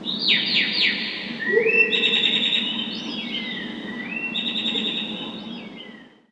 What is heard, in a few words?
jungle call; kjungle; cstrike